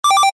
ポーズ レトロ

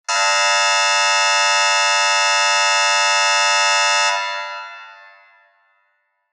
劇場やホールの開演ブザー リバーブ有り; 劇場やホールの開演ブザーその１; 演劇・舞台

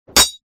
槍 金属音, 武器効果音

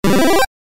アイテム出現 レトロ

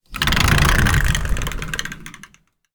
横開きのドアを開ける; 日常生活的音效

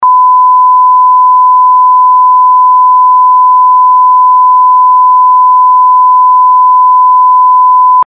自主規制音（ピー）ロング

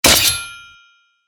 トラップ 刃 針 モノラル

